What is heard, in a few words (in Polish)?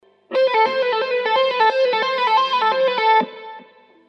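gitara elektryczna pentatonika a-moll zagrywka szybki 3 dźwięki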